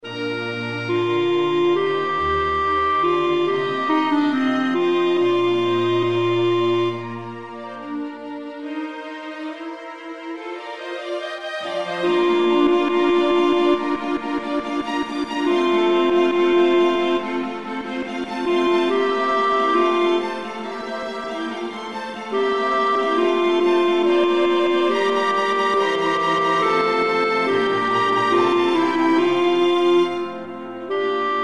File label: Organ Kyrie